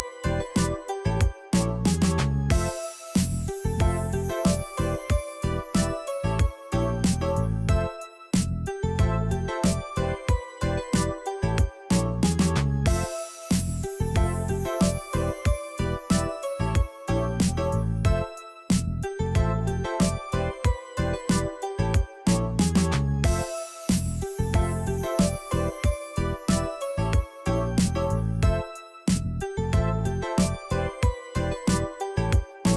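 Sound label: Game background music; Ludo-3D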